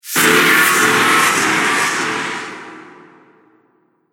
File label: Crash Huge Epic Movie; Miscellaneous Impact Sound Effects; Impact / Explosion Sound Effect; Andrew Fleming